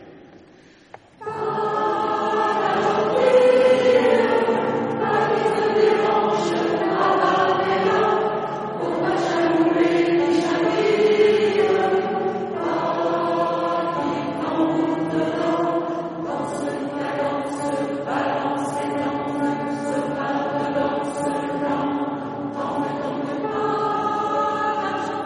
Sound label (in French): canon a 3 voix